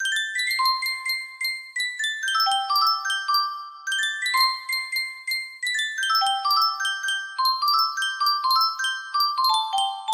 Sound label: Sankyo custom tune music box